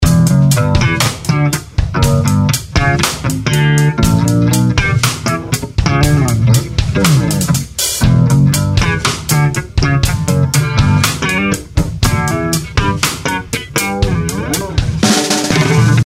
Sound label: drum bass; Drums